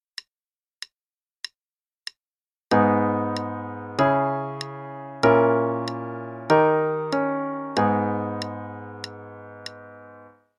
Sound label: Chord Progression - g sharp minor (qn=96); CM Level 6